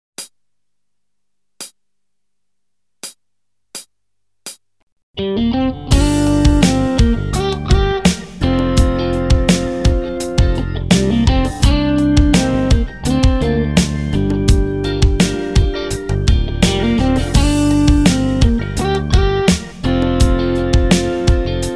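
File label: Pentatonic Minor, guitar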